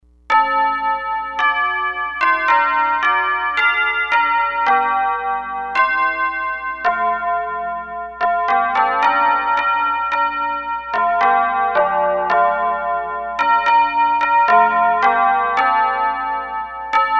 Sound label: Fight song - Carillon